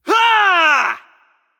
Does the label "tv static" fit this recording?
No